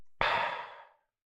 reaction ahh masc; food-and-drink; consumable; consumables

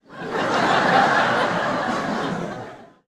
sitcom studio crowd laughter; sonniss gdc

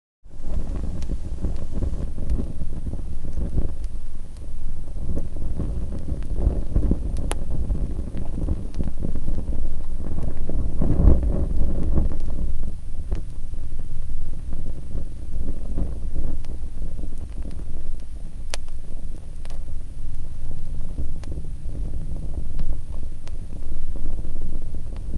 fire cotton; fvtt sonniss gdc audio

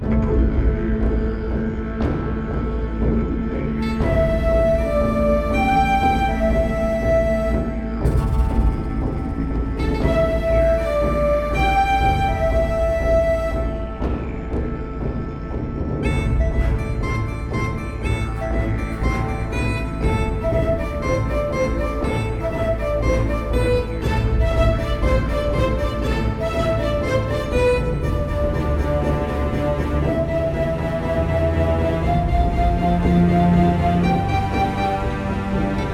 black shadow loop

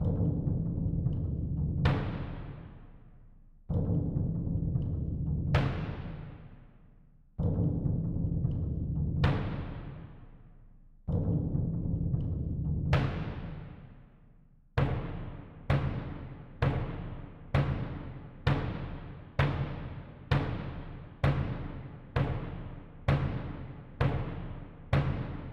Orchestra Bass Drum; McRoMusic; Summoning Circle

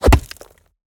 mountain dweller tool axe whoosh impact; bluezone; sonniss gdc

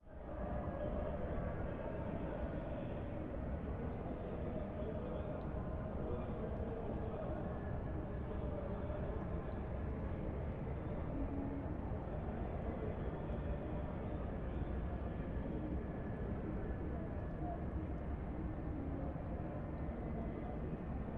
international carnival ambience far distance; fvtt sonniss gdc audio

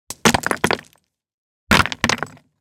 drop rock on rock debris; sonniss gdc

